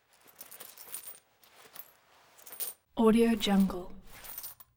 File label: coins in pocket